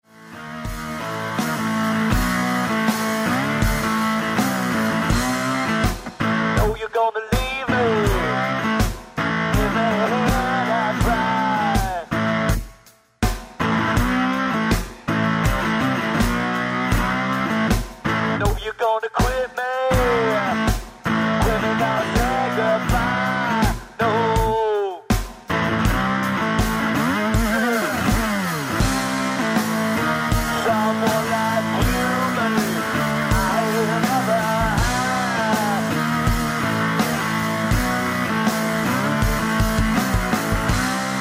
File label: Sexy Blues